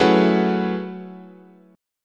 Gb Minor 11th, chord

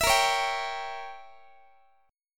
Bb Diminished 7th, Guitar; strummed; x,x,19,20,17,18; 4A,4E,5A,5D,5G#,6C#; Bbdim7

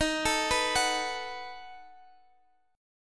B 7th Eb

